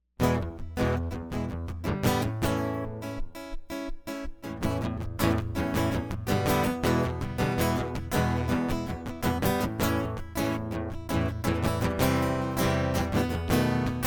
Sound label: Hiccup guitar intro